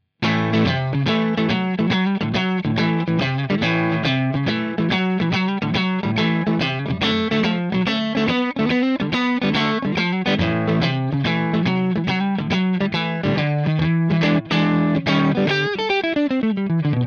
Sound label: blues riff Torpedo Orange 2x12